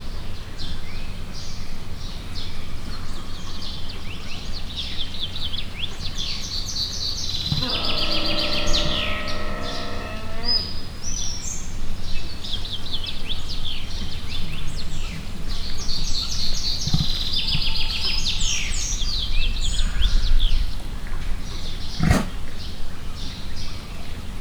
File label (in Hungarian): szemből kétpúpú balról rénszarvas; miskolc zoo